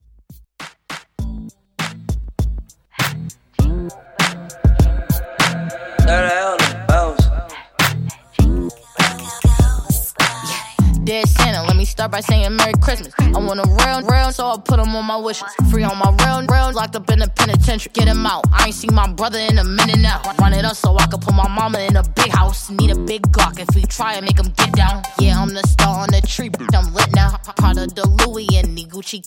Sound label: Hip Hop Redrum; Remixfreakz